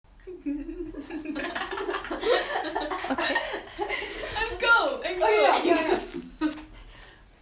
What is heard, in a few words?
Laughter